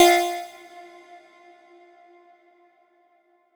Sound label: lead jinkees F; leads